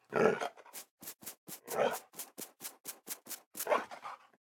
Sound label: wolf panting; mob; Minecraft; Votive World